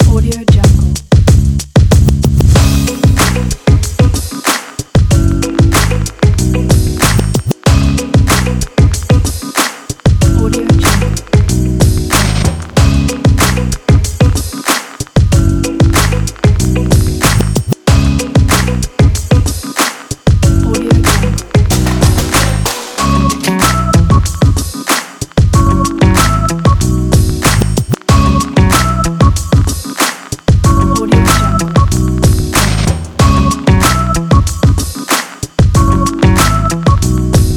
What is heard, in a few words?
hip hop